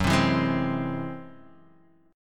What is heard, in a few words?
Ab Augmented 7th Gb, guitar